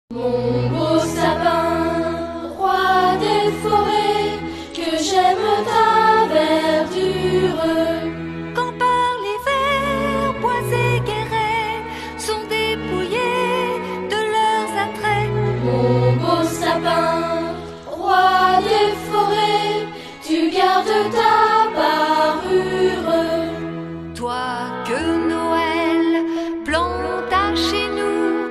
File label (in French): Chant